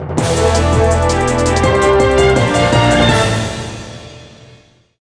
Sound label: Match Win; yu gi oh power of chaos yugi the destiny